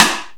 SIDESTICK; Greytsounds VOL-1; SDX SNARES

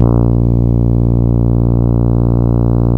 A#, Synclav FM, BRASS; Big Fish Audio

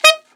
ALTSAX D3; Best Service; Alpine Volksmusik

